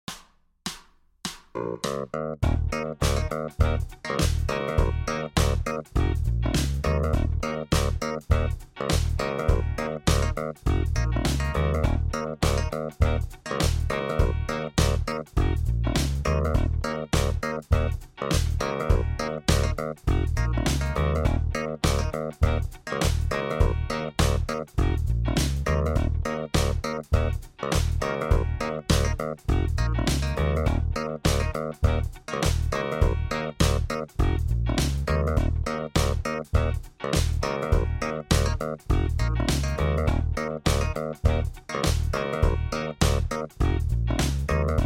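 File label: BPM 102 E flat minor, song jams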